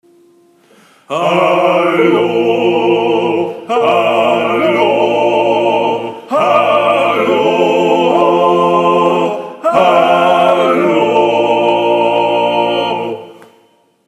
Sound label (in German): Hallo ALLE STIMMEN; Gesang